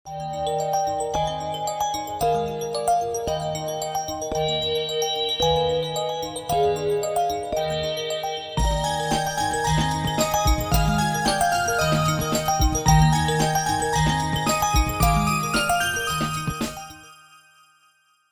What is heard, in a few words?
Alarm; Okapia; Air